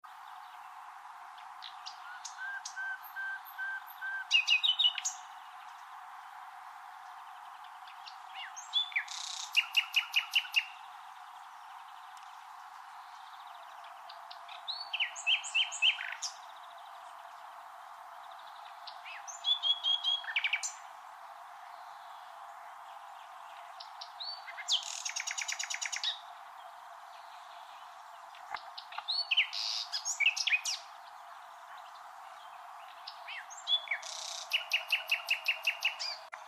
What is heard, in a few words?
Luscinia megarhynchos; SETE